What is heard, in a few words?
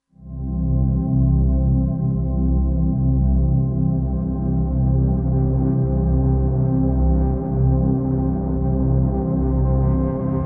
PAD AIRY; Beyond Earth